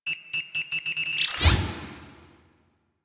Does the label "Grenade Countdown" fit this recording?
Yes